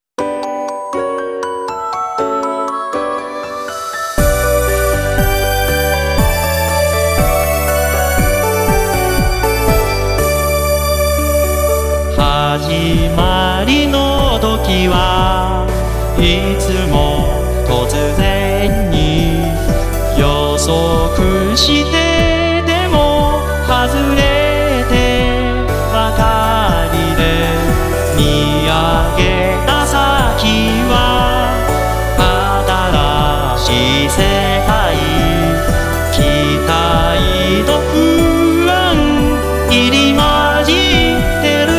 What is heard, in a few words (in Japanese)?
utagoe